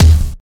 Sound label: clean kickdrum d sharp key; kick drum samples; one shots